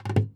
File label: JAZZ TRAP KIT